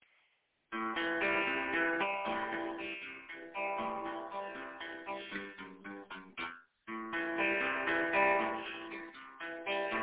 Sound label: arpeggiated minor lick and chords